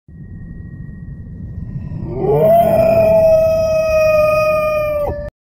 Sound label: Yeti, هیولا; یتی